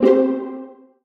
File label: PUZZLE Success Violin Pizzicato Three Note Wet; stereo; Universal Sound FX